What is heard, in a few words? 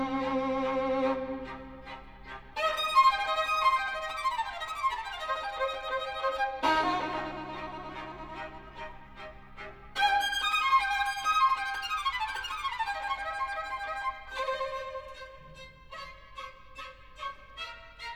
Violin and Strings, Allegro; Concerto for Violin and Strings in F Minor Op 8 No 4 R 297 L inverno I Allegro